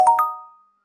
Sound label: success chime; huashu design; sfx; feedback